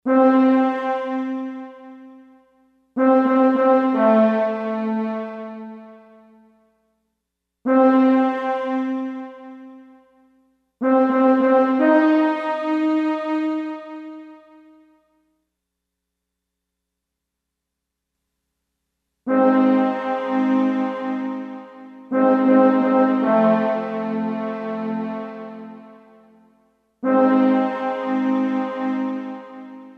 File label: Wet Triads, Lexicon MPX 1; Factory Programs